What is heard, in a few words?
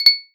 Regatta Timer; iOS 27